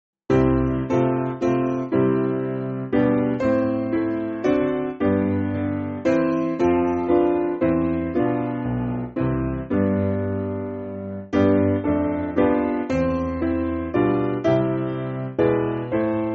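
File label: Piano, Music Backing Files for Churches; Walk In The Light; Tiltey Abbey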